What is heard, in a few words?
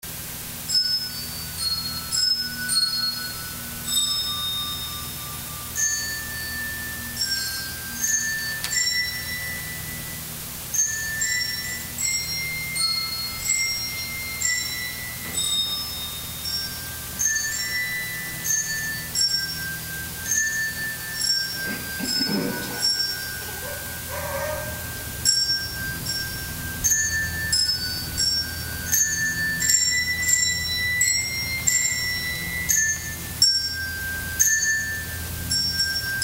Bell Youth Choir